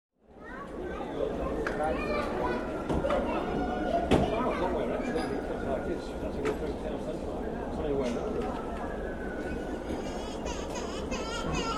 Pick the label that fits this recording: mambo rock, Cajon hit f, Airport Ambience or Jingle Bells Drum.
Airport Ambience